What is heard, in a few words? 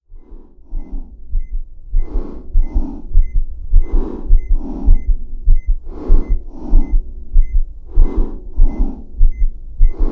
heart fast; Apotheorasis